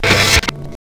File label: FX GTR, GUITARS KIT